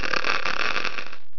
zombie idle

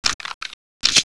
pistol reload; wanted; weapons

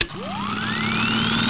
gat spinup, weapons; wanted

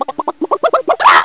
chick spawn; chicken; wanted

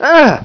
ahhh; wanted

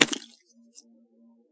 inject, syringe

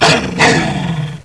puma attack; wanted

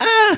aahhh

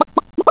chick scream; chicken; wanted

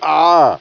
pain

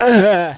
pain; wanted

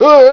pain; wanted; twnwest

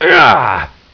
pain; wanted

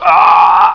die, cowboy; wanted

